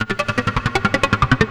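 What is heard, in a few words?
SYNTH LOOP, 160 BPM; Ueberschall; Drum & Bass Flash Trackz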